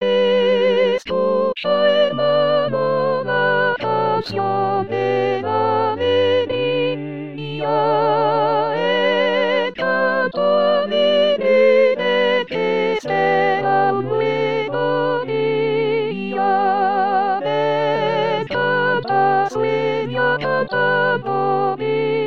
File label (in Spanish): himnoalegria S voz, con voz; Coro "Con dos Bemoles"